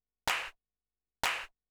CLAP HOUSE 125; eJay

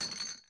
amb lounge misc

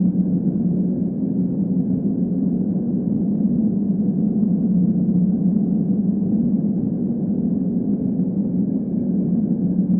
ambloop; DAoC

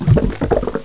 bubble; Denkspiele; Diabolica2

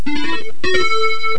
victory

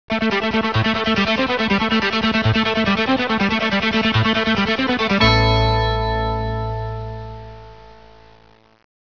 tune; Professor Multimedia

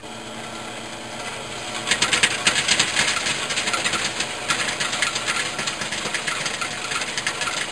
Coin Counter

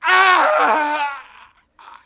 death yell; SPECOPS

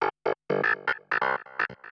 SYNTH HOUSE 125 A; eJay; Keys

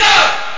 Eat Ghost; PlayPac

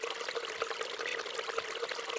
brook loop

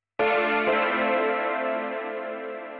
synth house 125 A; eJay; Keys

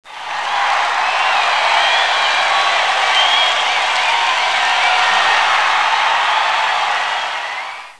CHEER

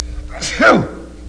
sneeze; Sound Library 2000; Wayzata Technology; People

